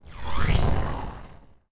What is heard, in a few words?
whoosh